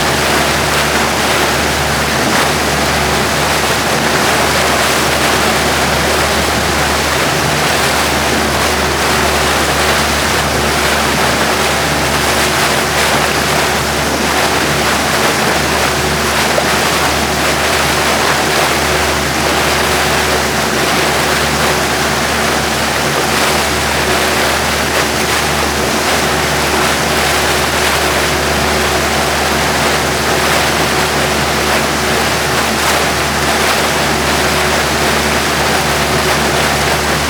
zodiac surface water; mono; Call of Duty Modern Warfare

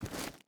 footstep rubber boots gravel walk; Rust

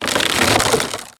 objects, World of Tanks

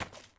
bandage pickup; Escape from Tarkov

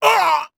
hurt heavy

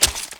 Inf Weapons Foley; Red Orchestra Ostfront 41-45

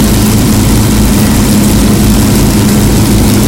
avro lancaster side, engines; War Thunder

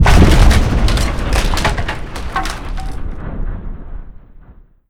explosion sub hit shake

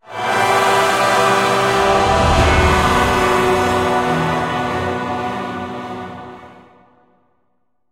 oper end fail

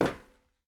walk proflist; Escape from Tarkov

